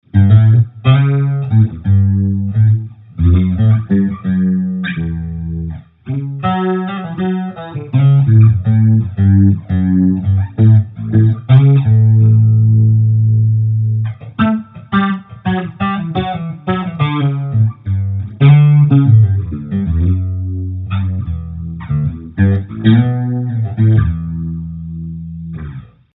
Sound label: Fretless